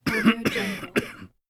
the male coughing

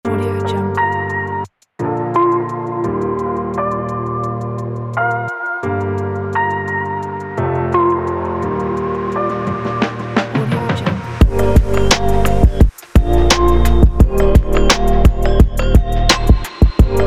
summer chill vlog

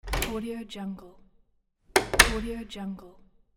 opening and closing door

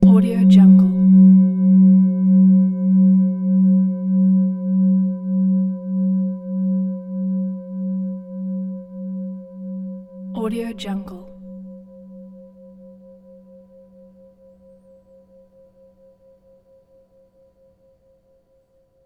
resonante singing bowl

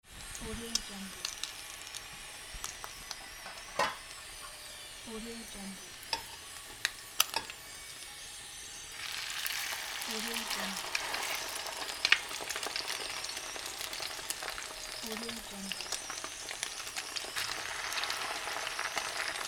kitchen cooking ambience

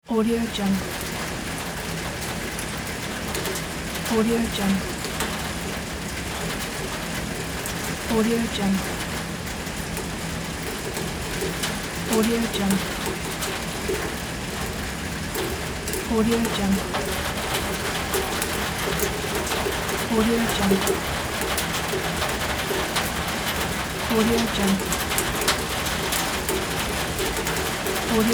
rain; ralevki

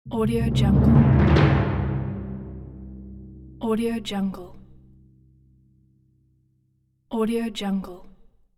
timpani roll

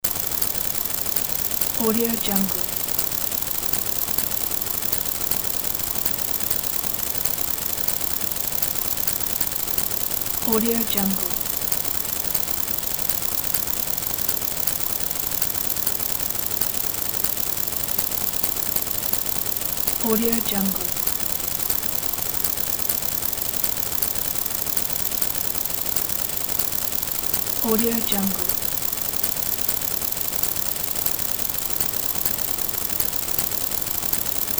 background noise

